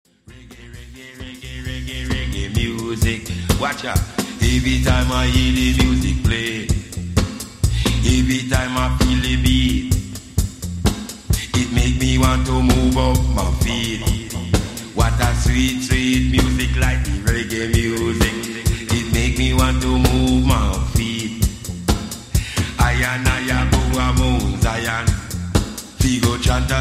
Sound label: Reggae Music